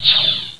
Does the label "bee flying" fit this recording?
No